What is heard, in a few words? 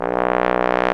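BRS Bass Trombone, Solo; Roland L-CDX-03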